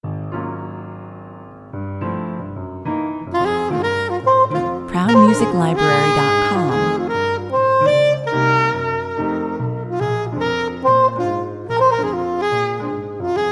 piano blues

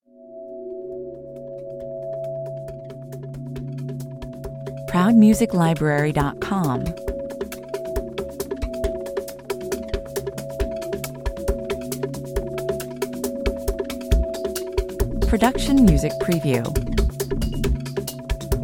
tribal boundaries 136 bpm

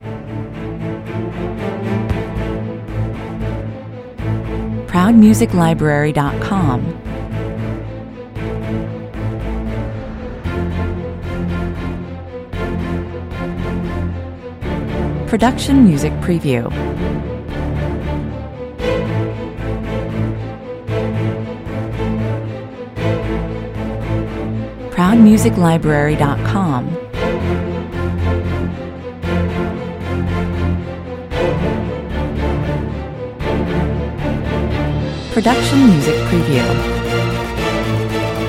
orchestral mix low intensity

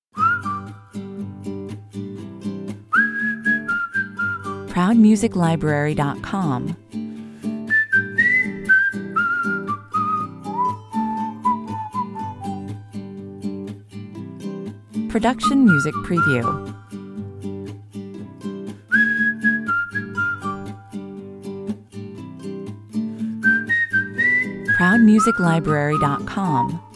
blue eyes (whistle), 121 bpm

